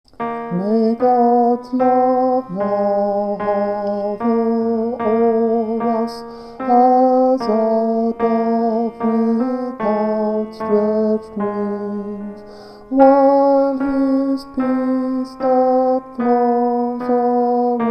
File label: tenor voice